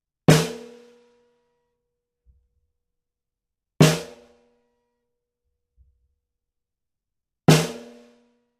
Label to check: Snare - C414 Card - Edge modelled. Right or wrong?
Right